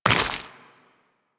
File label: Step Goo, Footsteps Walk; Warframe; Tenno